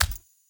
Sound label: Steps; Warframe; Characters; Kalymos